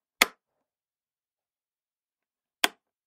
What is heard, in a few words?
TURN-SWITCH LIGHT ON OFF; house, lamp